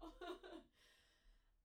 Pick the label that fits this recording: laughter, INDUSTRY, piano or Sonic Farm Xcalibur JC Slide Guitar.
laughter